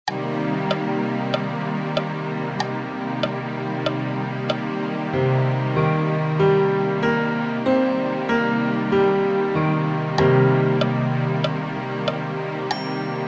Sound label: min7, ear training